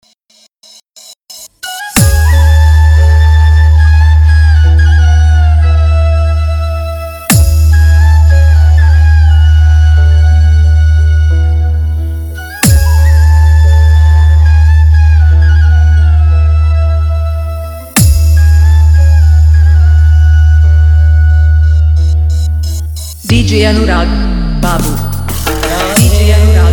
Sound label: Remix; Jhulus Mela 2021; Vibration